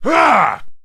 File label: man ugh; Mount&Blade Warband